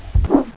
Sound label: throw; abuse